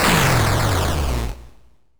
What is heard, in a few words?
playerdie